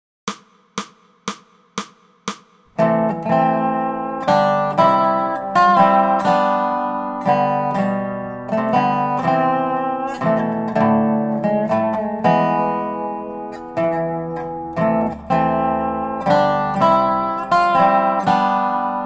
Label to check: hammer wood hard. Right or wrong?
Wrong